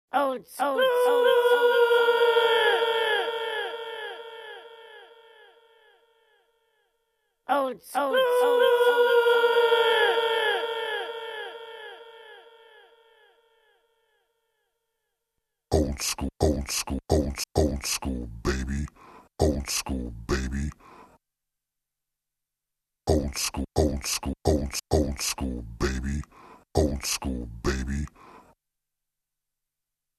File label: Oldschool (A capella) (130 BPM)